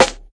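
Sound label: classic hip hop Snare; Classic Hip Hop Mega Kit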